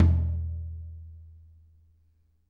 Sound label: R B Floor Tom A Close; Sonic Foundry (Sony Creative Software) - Drums from the Big Room The Mixes; R&B One Shots